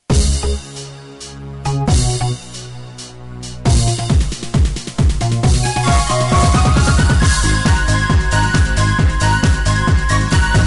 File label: techno